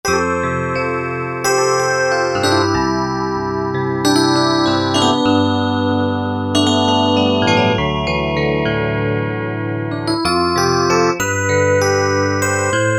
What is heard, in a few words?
Deluxe EP